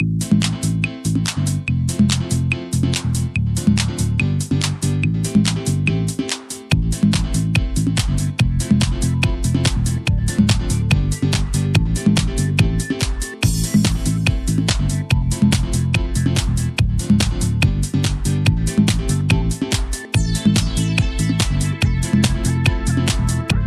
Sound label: fitness beat; 143 BPM